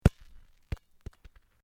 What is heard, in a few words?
Sport Soccer Kick Gravel, Soccer ball sounds